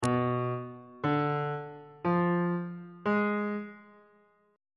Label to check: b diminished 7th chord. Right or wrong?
Right